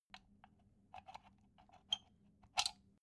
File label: socket pull plug soft long